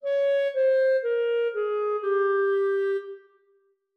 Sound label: 5 notes F min